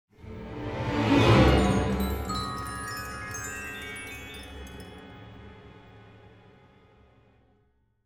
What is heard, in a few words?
sting orchestral; Film Noir; SoundPad